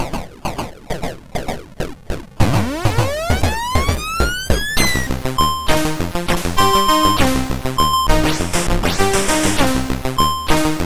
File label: Synth, MOS6581